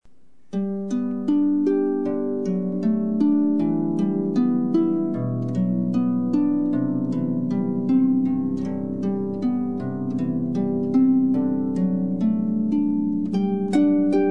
Harpist; Seattle Harpist

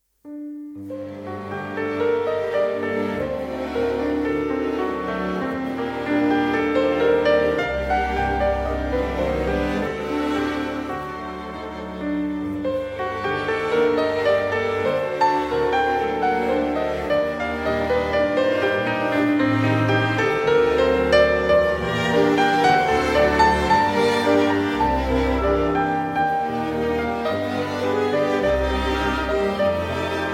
Classical Pianist

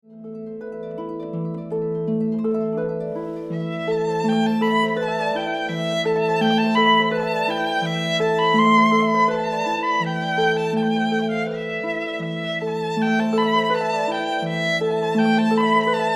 Harp and Violin Ensemble; Seattle

